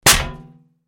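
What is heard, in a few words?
cartoon hit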